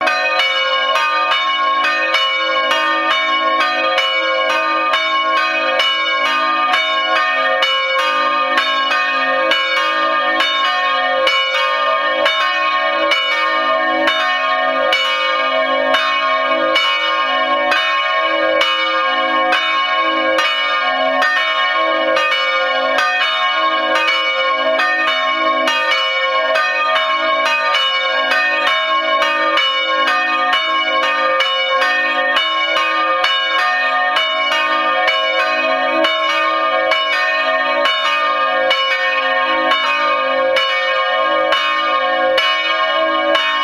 Glocke IV III

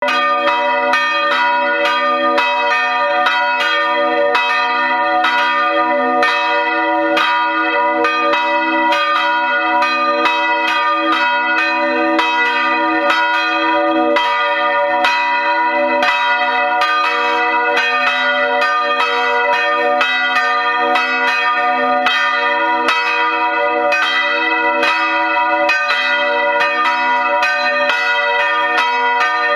Glocke IV II

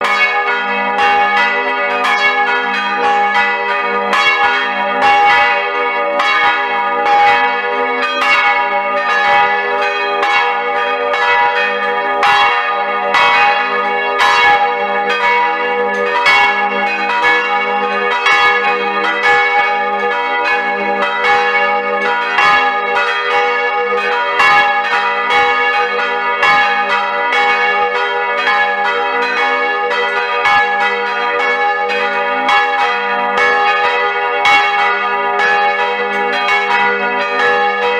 Glocke IV II I

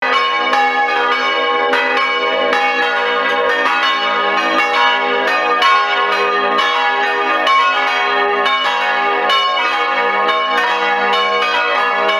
Glocke IV III II I

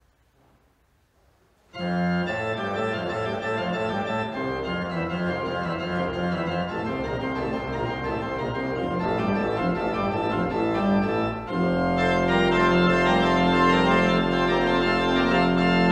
Orgelvorspiel

